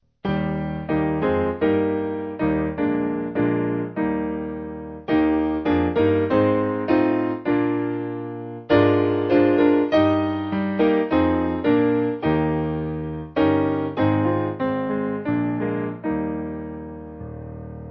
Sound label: Piano; Fill Thou My Life; Lloyd